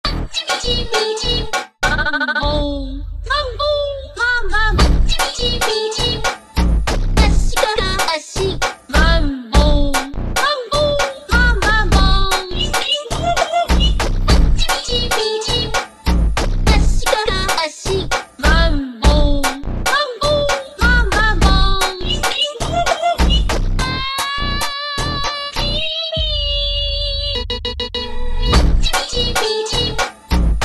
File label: Funk extreme Slowed